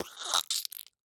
Mouthy, cheek, press, squirt, squish, spurt, mouth fart, strong; Eiravaein Sound